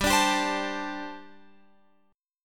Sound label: G Suspended 2nd Suspended 4th, Guitar Open F; Gsus2sus4; strummed